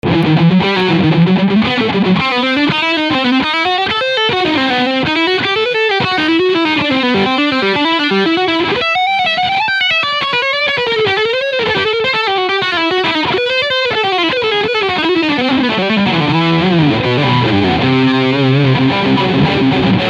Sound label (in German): Dean MAB4 Leadsound Steg Pickup; Michael Batio